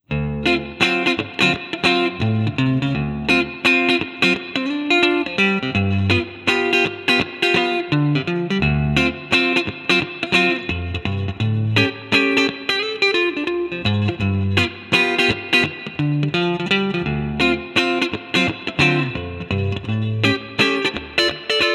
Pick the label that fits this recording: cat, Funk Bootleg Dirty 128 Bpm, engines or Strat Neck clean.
Strat Neck clean